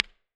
AC30 normal BX44 close; IRs

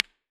AC30 normal AT4033a stalevel, IRs

